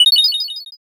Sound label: sparkle